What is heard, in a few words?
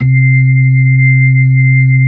B-3, Hollow; Keyboards of The 60's and 70's